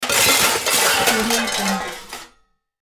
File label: large garbage throw out